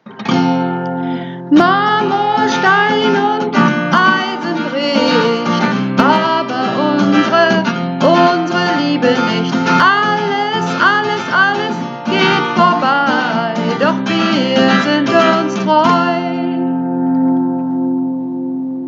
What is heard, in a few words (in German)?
Hauptstimme